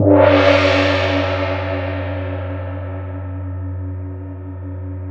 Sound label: Gong Med f; Digital Sound Factory Virtuoso